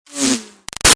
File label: Arrow By Zip Ruffles; Bows and Arrows; Combat; Big Room Sound